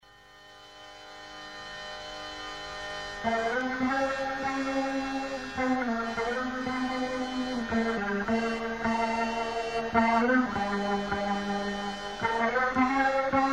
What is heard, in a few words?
Hare Krishna Mantra